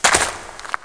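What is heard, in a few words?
footstep grass; thief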